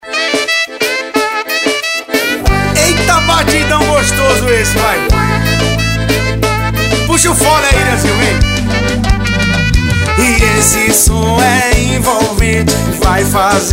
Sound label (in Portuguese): toque no celular